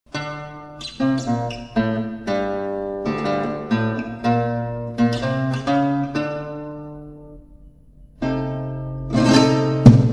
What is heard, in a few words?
Tango, guitar music